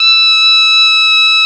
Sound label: BP MID E3; USB Soundscan vol.42 - Celtic Flavours; AKAI